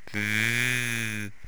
bee; khanat; megaglest

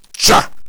warrior attack; khanat; megaglest

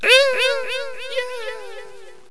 carpet die; megaglest

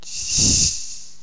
snake attack; snakes

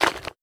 slime, animals

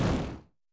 orni hit; sfx; pow

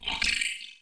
ghost armor ack; khanat; megaglest

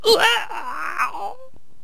die, death, haaaaaa; voices; stickfighter

